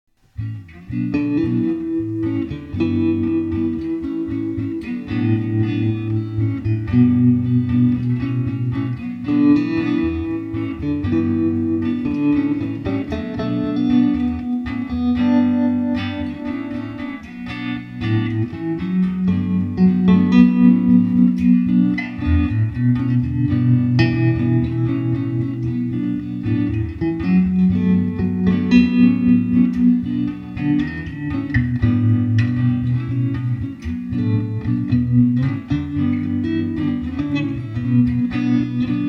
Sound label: tremolo solo; effectrode